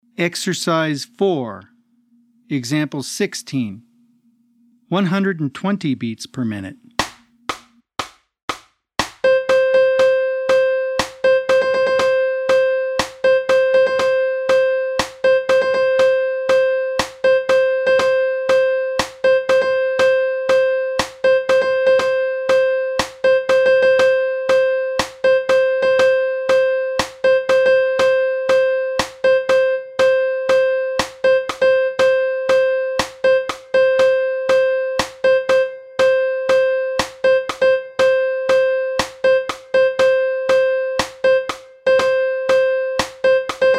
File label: 120 BPM